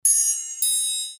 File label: triangle